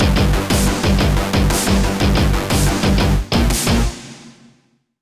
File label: Action Event Industrial Boss